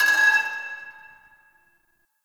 Pipe Cement Drop

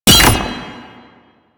Grenade Bounce; Lasrian Goonadier Grenade; Lasrian Soldiers; Weapons

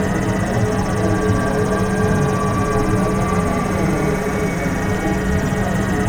Gas Core Loop; Warframe; Corpus Gas City; Ambience

